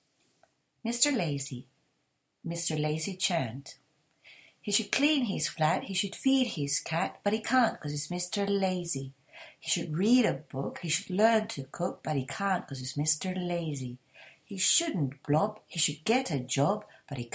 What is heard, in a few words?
chant